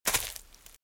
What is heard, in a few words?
Footsteps - Leaves - Walk - Right; RadwinIsland